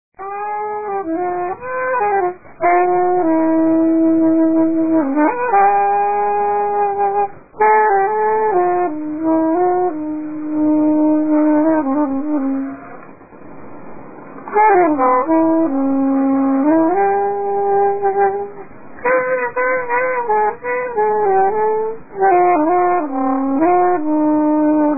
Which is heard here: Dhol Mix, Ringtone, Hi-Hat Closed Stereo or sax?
sax